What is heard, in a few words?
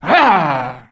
afflicted f attack